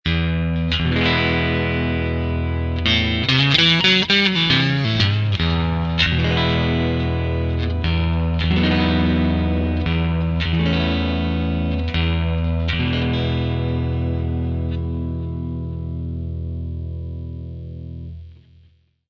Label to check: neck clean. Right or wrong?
Right